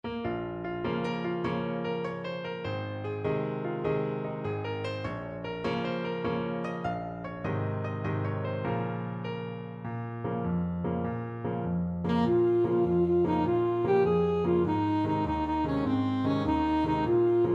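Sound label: alto saxophone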